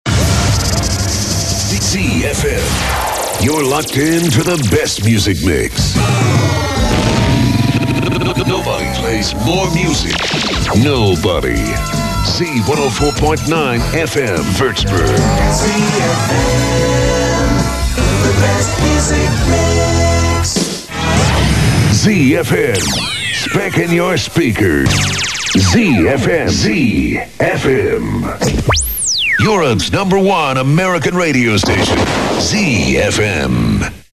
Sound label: afn jingles; wuerzburg